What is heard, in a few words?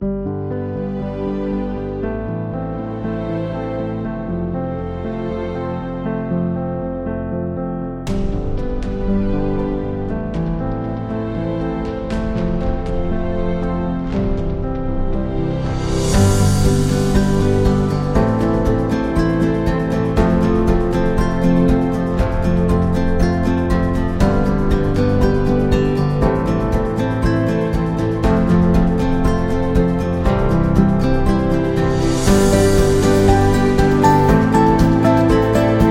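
Love Music, Kids background music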